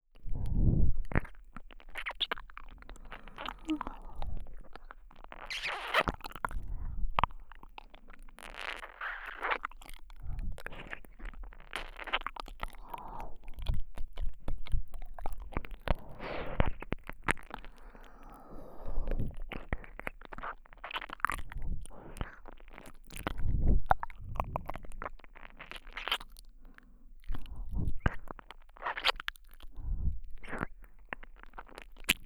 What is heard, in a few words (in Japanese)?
右耳舐め 弱い